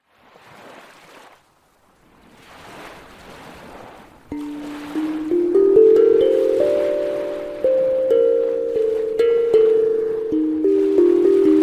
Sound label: D Phrygian